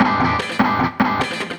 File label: guitar, Emin; Big Fish Audio - Hardcore Breakbeat Action; Hardcore Breakbeat Action CD1; 07 Testify 150 Emin